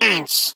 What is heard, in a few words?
spy penguin vo hit wall